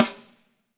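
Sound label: snare drum, Drums; Bob Clearmountain Drums I; SD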